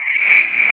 SCI FI; East West - Phat & Phunky

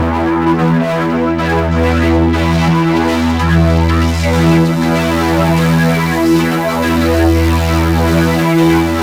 MORPHUZZ, Synthy Tonal; Distored Reality

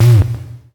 SHORT, SHORTNOIS; USB Soundscan vol.14 - Special FX Twisted

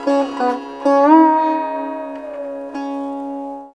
SITAR; Spectrasonics; Heart of Asia

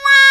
Trpts; Club-50 - Foundations Roland; Trumpets; Wa Trpts

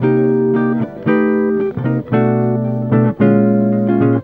EGUIT ARPEGG; Best Service; World Colours

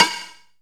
Shock Snares, Percussion; Dance Synths

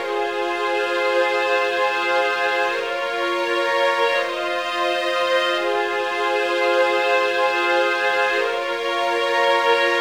Strings, 70s Analog Funk; Sonic Foundry (Sony Creative Software); What it Is! 70s Analog Funk; Session 15 - Wild Child

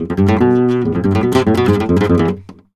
Guitar Picado, Flamenco, e; Picado 1; Best Service ProSamples vol.46 - Flamenco

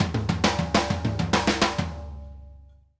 ALL ARND, drum fills; Sampleheads - New York City Drumworks VOL-1

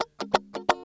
CHUCK KUH, FUNK CHOPS, guitar

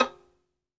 SOLO VI, Synclavier Strings; ILIO

